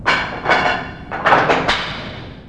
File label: Breech; panzer